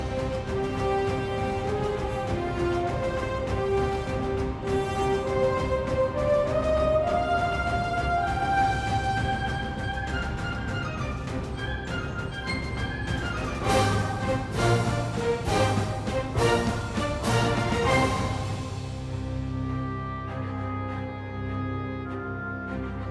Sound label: Action Urgent; rwby grimm eclipse